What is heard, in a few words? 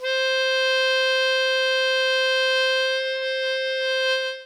Toy Sax Noise C; ESW Sphere